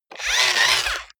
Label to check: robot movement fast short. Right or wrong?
Right